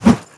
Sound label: spade light; melee weapons; tfa starwars melee weapons pack